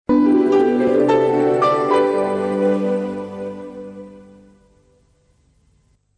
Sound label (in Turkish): reklam jenerigi; kanal d